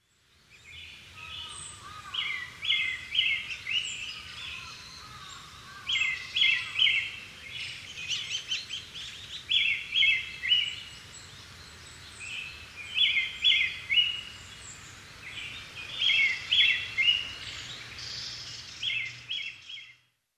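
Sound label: Brown-headed Thrush, kunitachi; akahara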